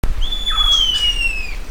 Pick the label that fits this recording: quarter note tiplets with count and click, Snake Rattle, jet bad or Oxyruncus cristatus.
Oxyruncus cristatus